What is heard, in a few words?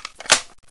clip insert, items; svencoop; echoes